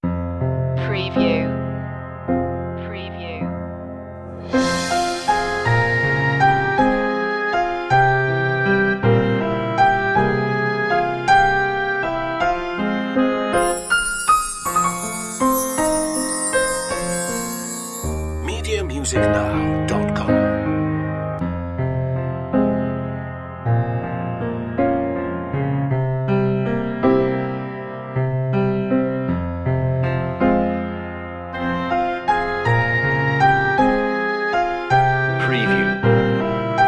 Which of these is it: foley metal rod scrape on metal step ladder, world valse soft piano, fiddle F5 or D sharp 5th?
world valse soft piano